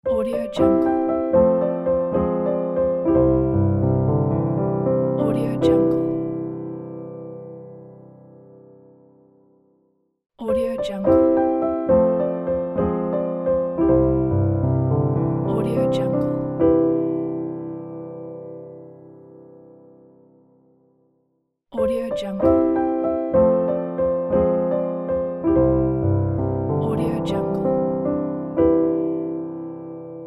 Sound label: piano jazz logo